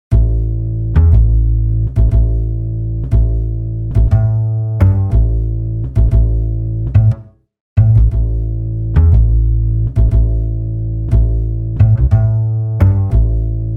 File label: Blues Jazz A minor 120BPM